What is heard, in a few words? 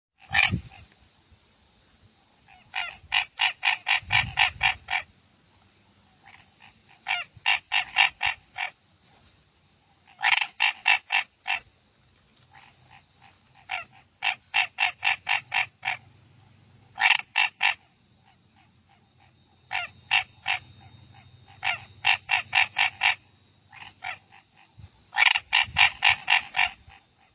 Boana raniceps; guyane